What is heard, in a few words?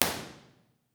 IR sweep